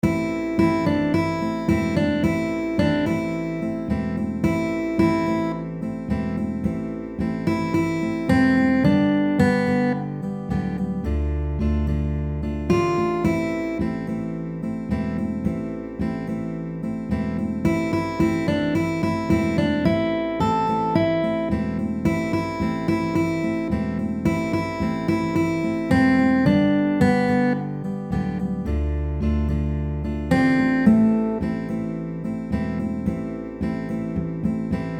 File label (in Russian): kukushka melodija